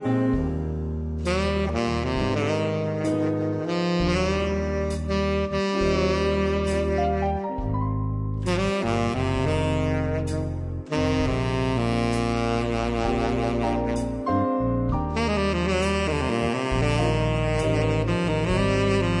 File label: Jazz SOLO